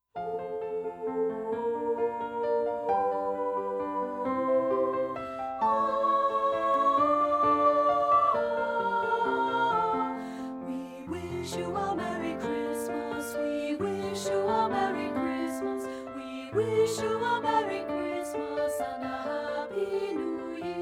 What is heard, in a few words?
WE WISH YOU A MERRY CHRISTMAS; partbypart